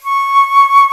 flute C#4, ebony flute; InVision Interactive; Lightware VOL-1; Instruments & Percussions

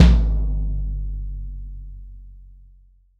GONG BASS

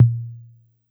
A2 Analog Tom, Dance Kit

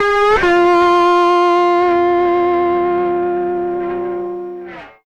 Lap Steel; Trip-Hop

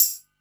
Tamb; AMG - Prototype Drum & Bass; Shakers & Rattles

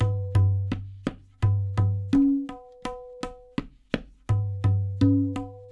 LOOPS, Percussionworks; Sampleheads; NYC Percussionworks; 90 s Sample CDs

